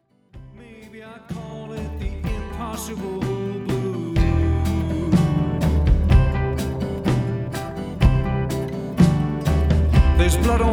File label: Siren Calls